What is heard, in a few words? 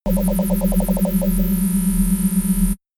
shot ringing wobble low; pulsieren